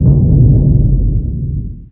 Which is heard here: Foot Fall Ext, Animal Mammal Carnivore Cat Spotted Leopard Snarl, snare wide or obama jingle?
Foot Fall Ext